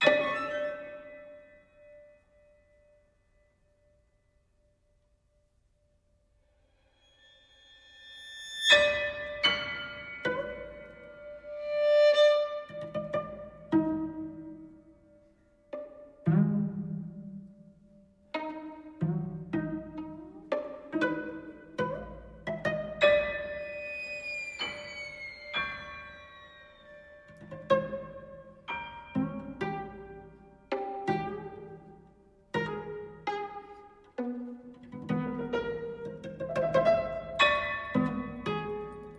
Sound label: for piano trio